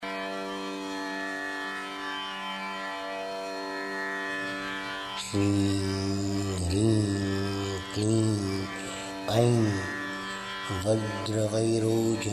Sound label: chinamasta mantra; yoga; mantry